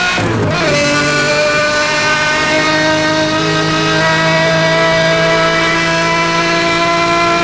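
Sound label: fourth cruise, car engine; sgmcars; zondac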